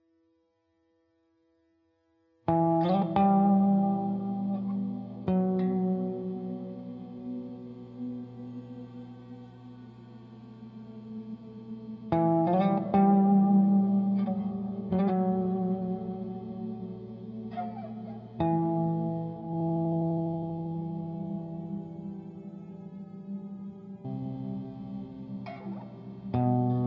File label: Dark Guitar with Light Pads; The Last of Us